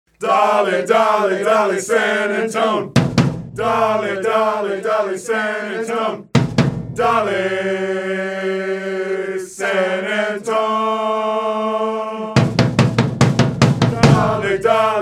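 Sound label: fanchants, football chants; dale san antone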